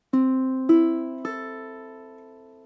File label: chord c e a, ukulele